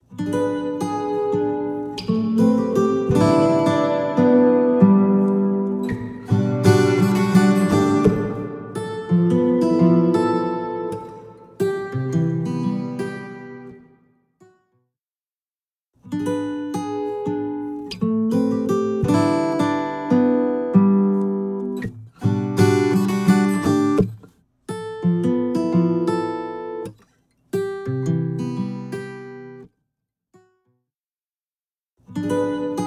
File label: Acoustic Guitar Recital Hall Stereo; ステレオ音源のサンプルは、7.1.2ch環境で制作したミックスをダウンミックスしてレンダリングされたものです; Blackhole Immersive